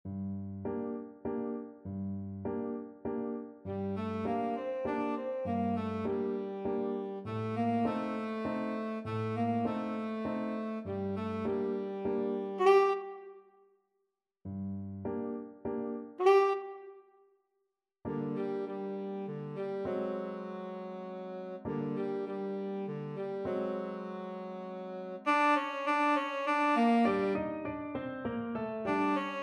tenor saxophone

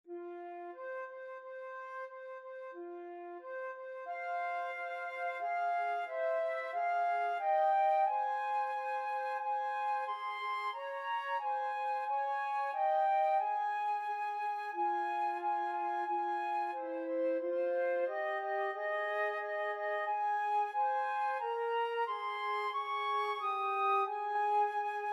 capriol pavane, flute duet

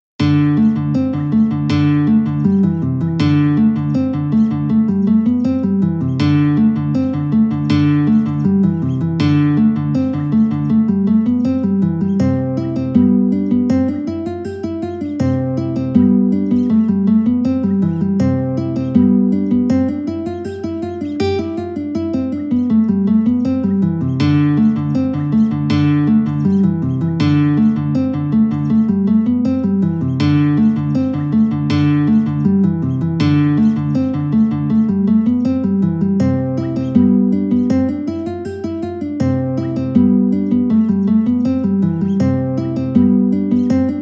drowsy maggie guitar

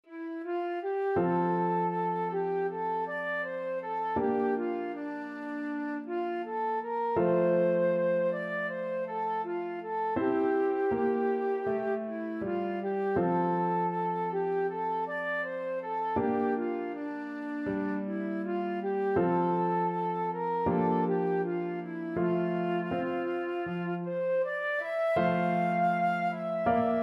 danny boy, flute

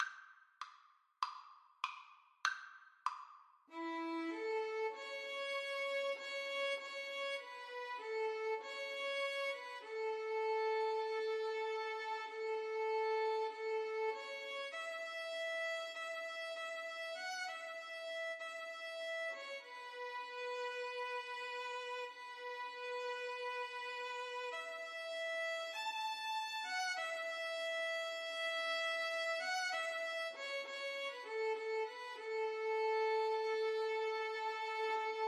violin duet

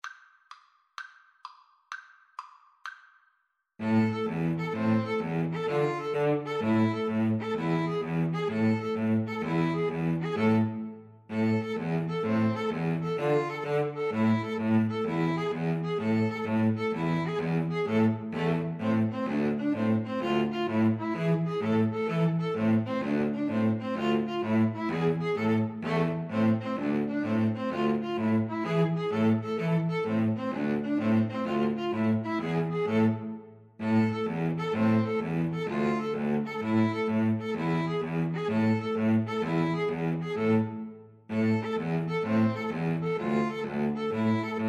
tarantella, 2 Violins Cello

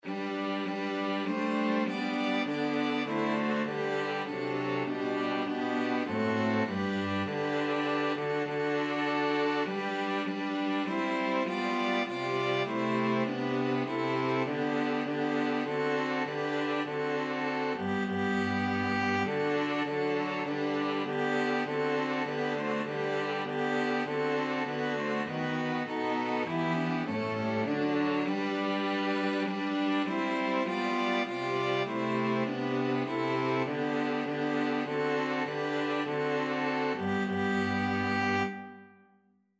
string quartet

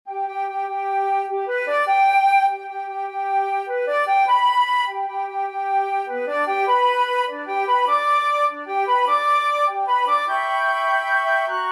flute choir

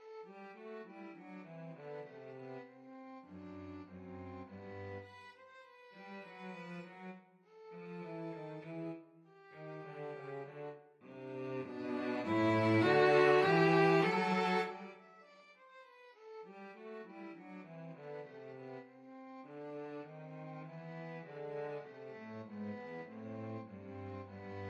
violin cello duet

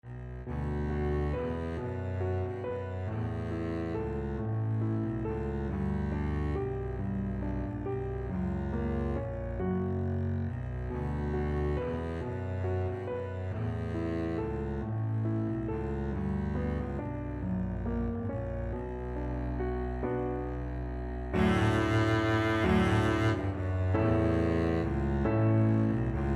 greensleeves, Double Bass

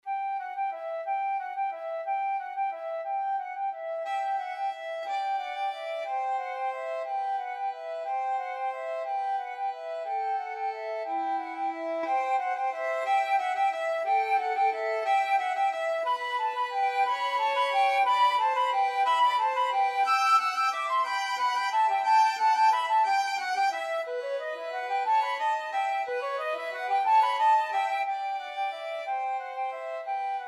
flute violin duet; carol of the bells

